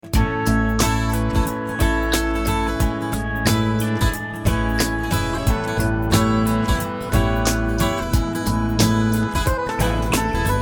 Acoustic Hop; Cue Palettes

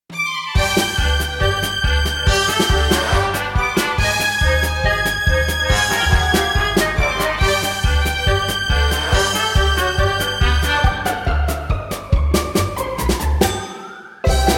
Silly Strings; Cue Palettes; comedy cues

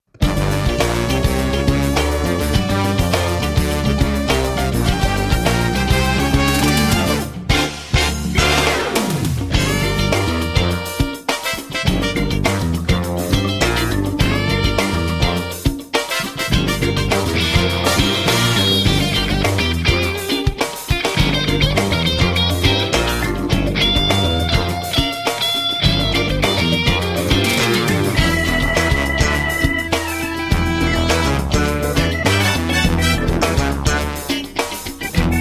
DISCO FUNKYNESS; Cue Palettes; Disco Cues

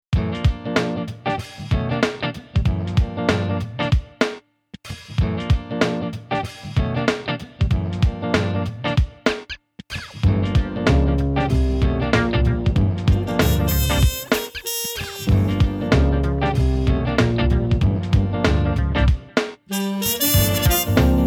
Funk the Jazz

